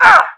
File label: pain; action-quake2